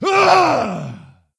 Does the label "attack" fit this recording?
Yes